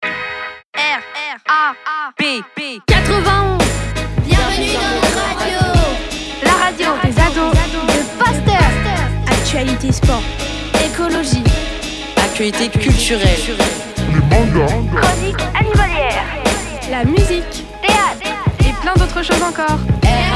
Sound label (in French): jingle, RAP, webradio; RAP91